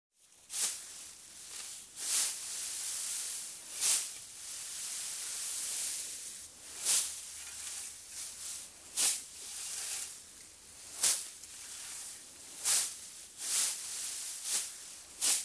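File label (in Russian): звуки сена манипуляции и действия с сухой травой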